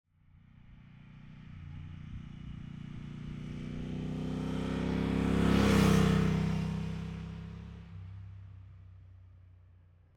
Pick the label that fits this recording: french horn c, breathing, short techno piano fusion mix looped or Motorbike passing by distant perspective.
Motorbike passing by distant perspective